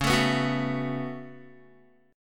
Db 7th Suspended 4th Sharp 5th Db down Guitar; strummed

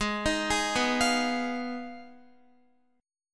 Ab Minor 6th, Guitar; arpeggiated; x,11,13,13,11,12; down; 4E,4A,5D,5G,5C,6F

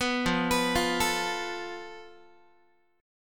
F Major Flat 5th Guitar, chord; arpeggiated

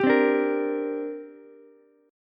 D 7th Suspended 4th Sharp 5th down Ukulele; D7sus4#5 strummed

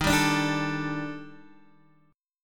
Eb Major 7th Flat 5th, Guitar Open D; down

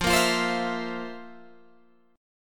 F Major 7th Suspended 4th, Guitar, Open G

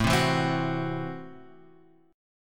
A Minor 6th A; Am6 Chord {5 3 2 5 X 2} strummed

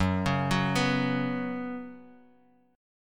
F sharp Suspended 4th; arpeggiated; F#sus4

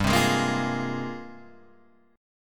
G sharp Major 13th

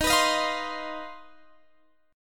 C Minor Major 7th Double Flat 5th D sharp

